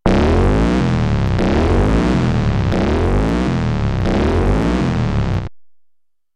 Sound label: warning; Prototype